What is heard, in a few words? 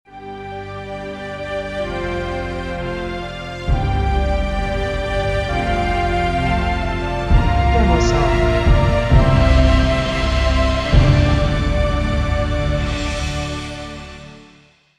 Happy Bright Soft Intro or Ending